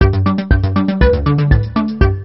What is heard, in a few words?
POP; QUICKEN